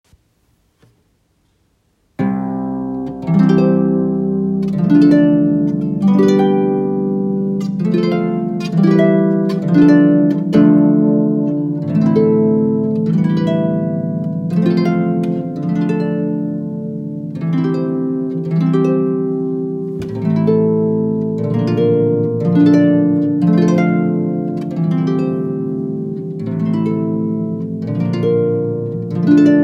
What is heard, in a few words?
harp